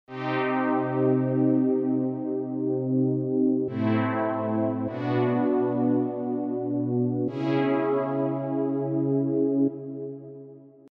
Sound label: bypass synth; shaperbox